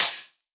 Hihat, Psytrance; One Shots

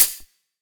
HiHat, Psytrance; Drum Shots

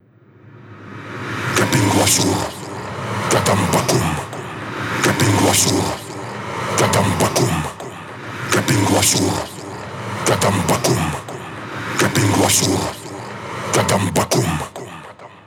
138 Shamanic Voice (Wet FX), Psytrance; Shamanic Voices

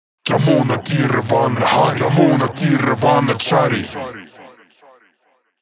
138 Shamanic Voice (Wet), Psytrance

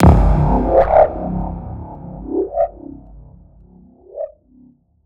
Impact; Singomakers; Infected Psytrance; Impacts

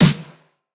snare; Singomakers; Psytrance Mantra; Drum Shots

